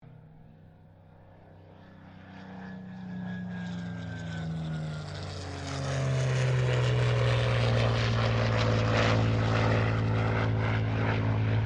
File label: AIRCRAFT SUPERMARINE SPITFIRE MK-XVI LOOP; Airplanes (Sound Effects:Vehicles)